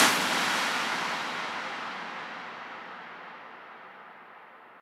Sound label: impact